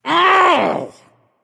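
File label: nonverbal ouch; smite; voicepack; merlin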